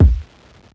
kick; eddyflux; crate bd; dough-samples

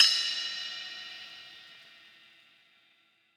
ride; eddyflux

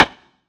snare; dough-samples; eddyflux

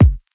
kick; eddyflux

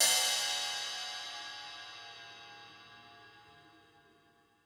ride; eddyflux

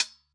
closed hh, crate; eddyflux

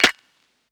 snare; dough-samples; eddyflux

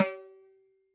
dhin, mrid

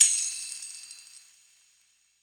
tamb; eddyflux

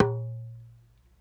perc; eddyflux

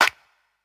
clap; eddyflux; dough-samples

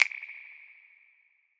snap; eddyflux

